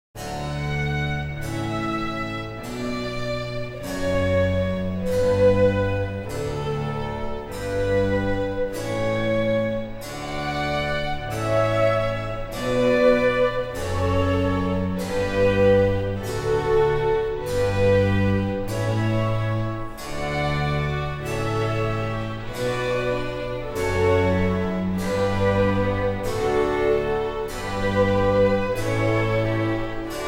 Theme du Canon; Johann Pachelbel

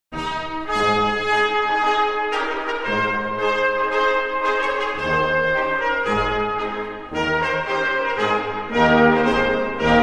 Les trompettes; Aida; Verdi

